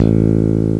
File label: bass A0; ClockWerx